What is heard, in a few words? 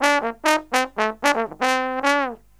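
trombone, Killer Brass Riffs; USB Soundscan vol.29 - Killer Brass Riffs; AKAI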